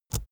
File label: keyboard laptop enter push release; kommunikation; computer